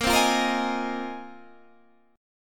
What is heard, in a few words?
A sharp 7th Suspended 2nd Suspended 4th, Guitar Standard chord; strummed; 18,15,15,15,16,16; capo=2